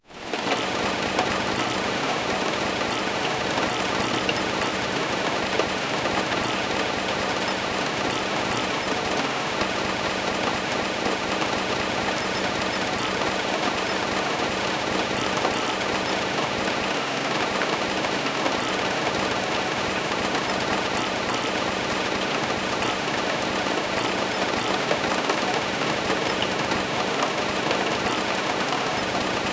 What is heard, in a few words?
the sound of rain in, caf